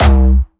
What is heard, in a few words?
C2, Jumpstyle Kick; Bassdrums; Jumpstyle Multis; vengeance essential club sounds-3; VEC3 Bassdrums